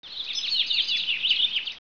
Bird Chirps; 11khz; Triazzle 1.0 Demo

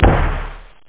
dzing, drums snare; OctaMED SoundStudio V1

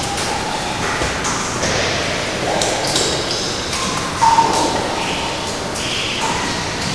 SEWER AMB; Renaissance

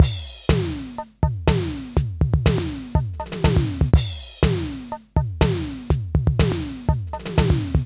Sound 80s Electro Kit; NI; battery

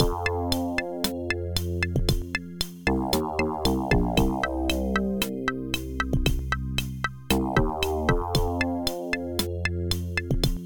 REGGAE; Multimedia Toolkit

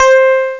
piano elec; Amiga Special - Software Hits; orchestra; keystring

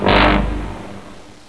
bone pedal, percussion instruments; Sound Effects for Multimedia - Volume 1

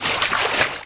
foot wat; Messiah; FOOTSTEP

